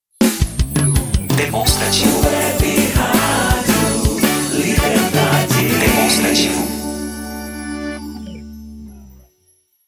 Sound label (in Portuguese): Radio Web Radio, jingles vinhetas cantadas